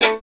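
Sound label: STR violin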